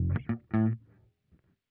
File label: Bass DI